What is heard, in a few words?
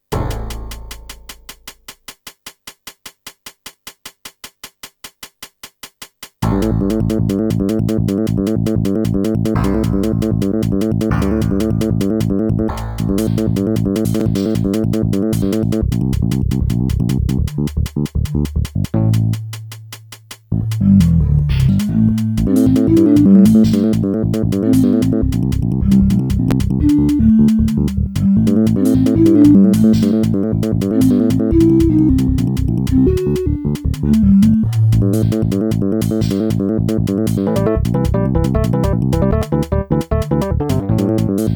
sb pci 128 ct5808, fm compare